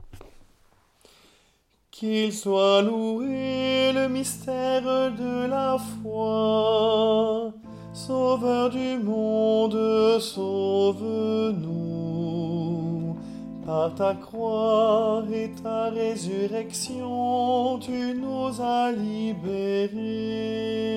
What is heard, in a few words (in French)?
Anamnèse, liturgie catholique